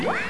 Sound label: Light Ball